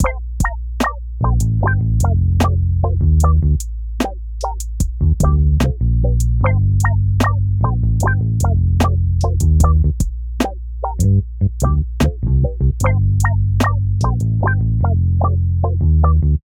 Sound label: Tone